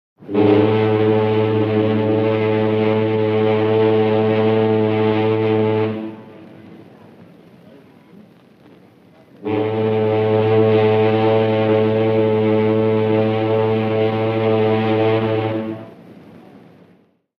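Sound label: BOATS & SHIPS HORN: exterior: 2 long blasts, deep low tone. British cruise liner with dock activity background.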